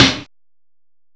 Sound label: HH CLOSE, CYMBALS; M8; CMI Factory